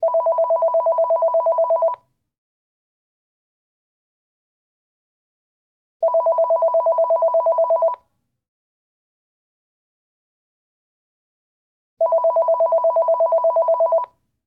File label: Phone Ring Office